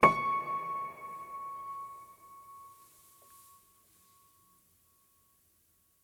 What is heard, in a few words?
KEY High C; NEO; Pianik Meta 2